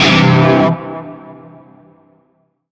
Shawzin Pent Maj Chord; G; Warframe; Narmer Shawzin

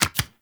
Tarot UI Card Click; Warframe; Harrow; Tarot Emotion Choice

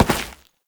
Step Dirt, Foley; Warframe; Oraxia

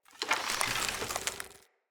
Bow Ranged Charge; Weapons; Player; Bows; Grand Father Bow

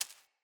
Sparrow Seed Feed Peck; Animals

